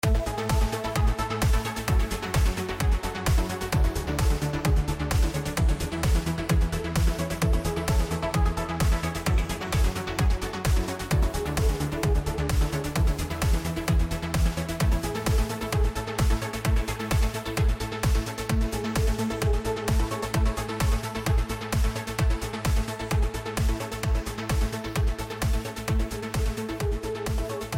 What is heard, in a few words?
Summer Trance